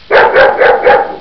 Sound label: Alsation